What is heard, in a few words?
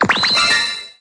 chocolate wheel symbol stop; koi princess